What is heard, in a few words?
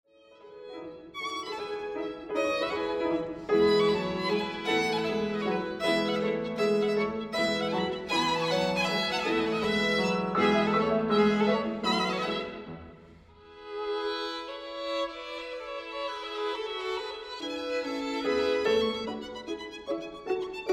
Musette miniature